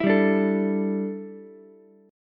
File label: E Minor 11th Ukulele chord, C6; Em11 strummed; JGuitar